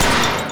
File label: stuck destroyed; TumikiFighters